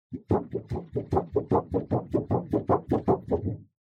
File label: Plastic Sheet